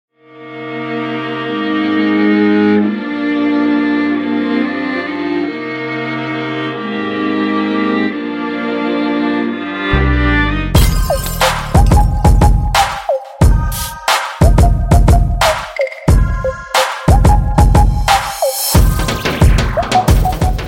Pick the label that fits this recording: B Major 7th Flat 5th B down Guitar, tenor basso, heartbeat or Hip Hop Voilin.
Hip Hop Voilin